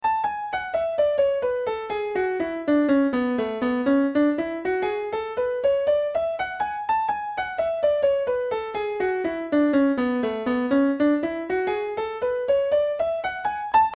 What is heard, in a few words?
Concert D Grand Piano